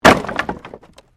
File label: Wood Movement Heavy